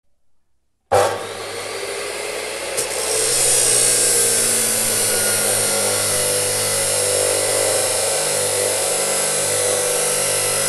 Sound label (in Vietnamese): tieng may cua sat thep cat kim loai